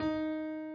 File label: key